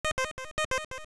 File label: alert